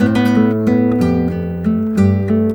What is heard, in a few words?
A MINOR LI, Nylon Guitar